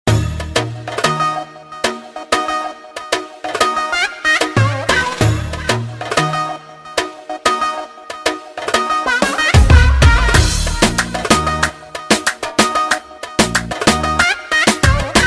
BASSU; BMW

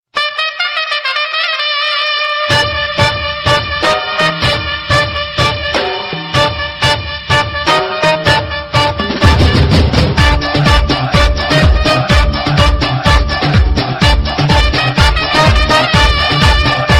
dhol beats; Ipl-5

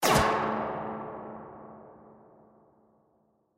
Proton Long Shot

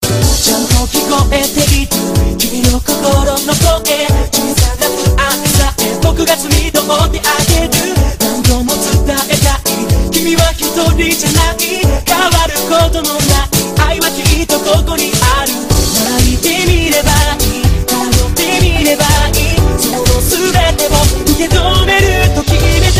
Anime Song

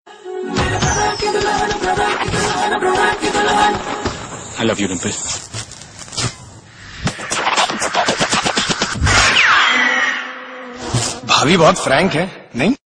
Latest Tone

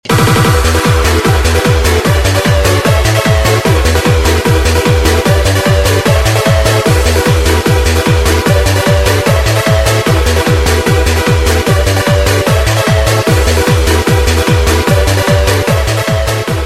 Bass Techno

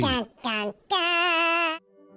Dun Dun Dunnnnn

